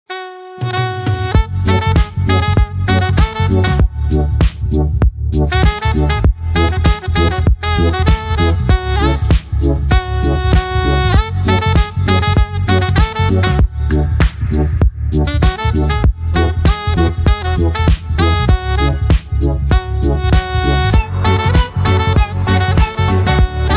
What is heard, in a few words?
synthetic sax saxophone cover